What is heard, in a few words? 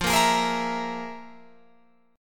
F Minor Major 7th Double Flat 5th, Guitar, Open F; FmM7bb5; strummed